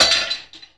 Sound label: Metal Glass Bits